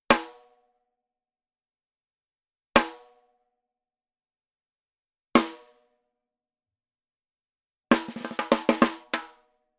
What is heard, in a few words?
SNARE Hybrid Rim Shot; evans; naciagi do tomow uderzany